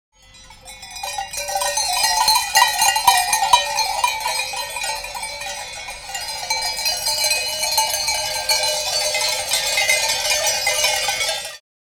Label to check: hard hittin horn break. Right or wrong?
Wrong